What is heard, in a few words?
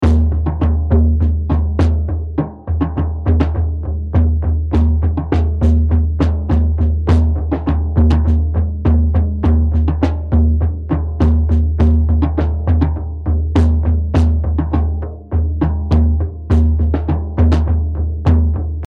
dark reso drums OUT